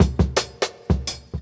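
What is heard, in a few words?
Jungle Breaks, 168 bpm; Original Jungle Breaks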